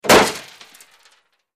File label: Bullet Impacts Metal